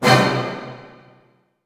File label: F# MIN FF, ORCH HITS, Orchestra; Sound On Sound; Peter Siedleczek's Orchestra